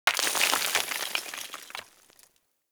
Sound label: Generic Explosion Rocks Small; Warframe; Explosions; Surface Hit Effects